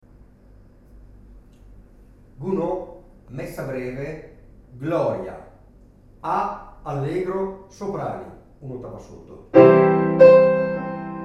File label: Gloria allegro Soprani